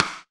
RIM MIX, AMBIENCE RIM

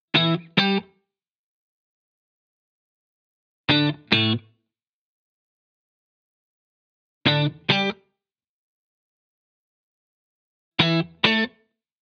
Guitar Bpm135 Key D, 70s Guitars; Fox Samples - Back to the 70s Guitars

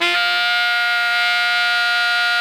ALTO GR F 4, Sax

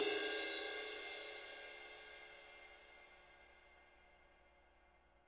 RIDE, RIDE CYMBALS; Spectrasonics - Backbeat

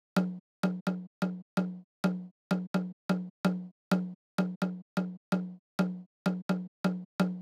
Drum Loop 128BPM Tom; VEC5 Drumloops; VEC5 Multi Loops; Loop Elements

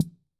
Guild MG10C-1 Perc, Drum Machines; Guild MG10C-1 Sample Pack; 300 Drum Machines

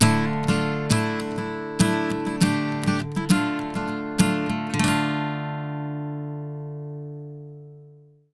100 MIN 2 CHD D, Acoustic Guitar Loops; Electric & Acoustic Guitar Loops; CHORDS; USB Soundscan vol.04; AKAI

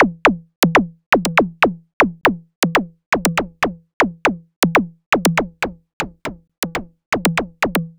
Subtractive Beat; Sonic Foundry (Sony Creative Software)

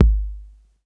Bass Drum Classic; Lost Tapes From Mars

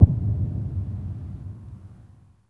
THUNDER FUZZ, Impacts, Hits & Booms; Distored Reality

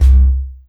BUMM, Anime Sound FX; AKAI Anime Sound FX

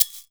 GANZA, Brazil Drum Perc; E-MU Producer Series Vol. 7 – Old World Instruments

